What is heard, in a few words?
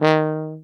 BARITON; Best Service; Alpine Volksmusik